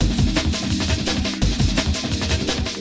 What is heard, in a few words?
ambient dnb padded, drum loops and breaks, 170 bpm; 161 to 180 bpm